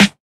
Snares

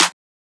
Snare

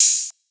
OpHat; stingray

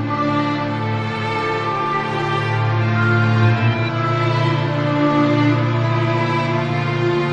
Loop

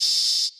Crash

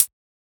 edm hihat; Closed Hats

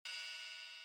Open Hat

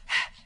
BREATH

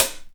Closed Hats

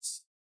Shaker

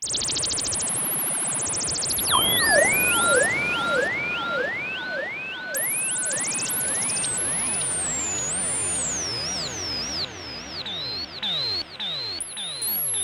Electrotherapy

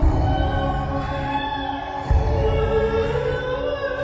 Loop

